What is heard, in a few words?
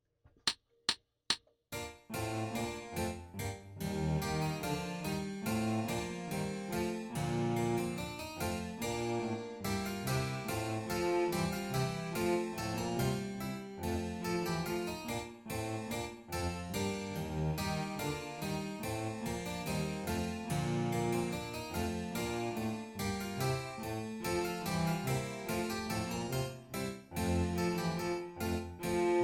Bouree Full Tempo Accomp Only; Handel-Andante and Bourree